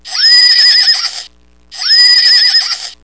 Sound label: horse; 馬; 動物